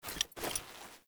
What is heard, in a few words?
Foley Action Default Soul Steal Combined, Default Clothing; Characters; Player